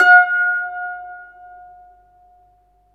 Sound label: SITUR F5; Spectrasonics; Heart of Asia